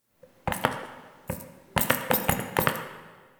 Idle Little Jump; Tagfer; Warframe; Ambience; Entrati

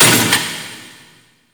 Gate; Vaults Stake Gate; Gameplay; Warframe; Entrati

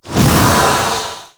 Tear Spawn; Entrati; Swarm

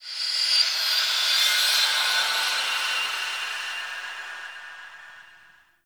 Zariman Mid Texture, Ambience; Warframe; The New War

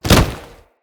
Generic Grab; Tenno

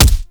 Weapon Punch Shotgun; Warframe; Weapons; Shotguns

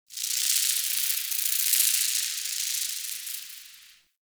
Insect Wing Flutter; Warframe; Ambience; Nokko Colony

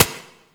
Turret Low Clip; weapons; Lasrian APC; Warframe

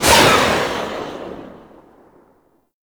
Rifle Tail Outdoor; Zariman Grn Wraith

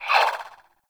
Swing, Weapons Melee; Warframe; Modular Melee

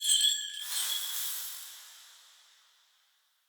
Mote Chatter Bell First; Motes

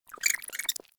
Rat Pet Squeak

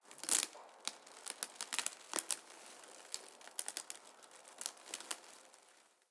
Amb Dead Tree Texture; Ambience; Mendicant Area

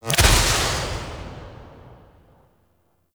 Impact; Grimoire

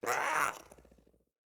Pet Speak; Catbrow; Pet Ship

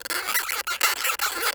Forest Rodent Squak; Ambience; Warframe; Grineer

